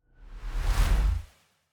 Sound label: big whoosh